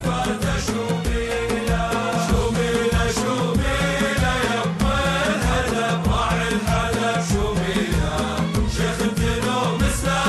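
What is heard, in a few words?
qatari song arabic ringtone; arbi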